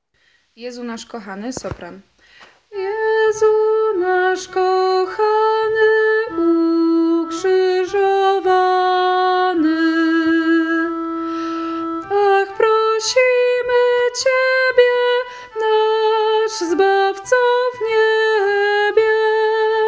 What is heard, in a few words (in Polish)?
Sopran; Jezu nasz kochany; Wielki Post